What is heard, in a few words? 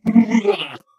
ballbot do it